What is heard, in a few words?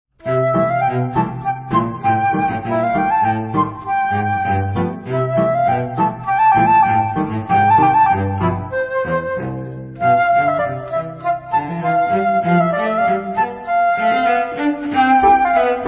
piano cello flauta